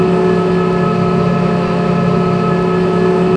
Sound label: crane move